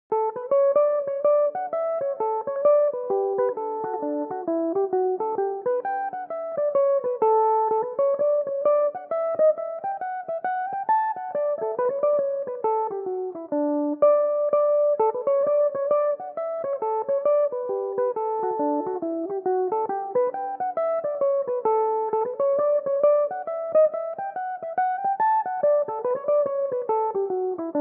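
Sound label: woodcock hill hornpipe D major, irish mandolin; Belmuse EM-190 electric mandolin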